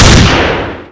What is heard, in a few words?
fire; HL2-Nerv; melts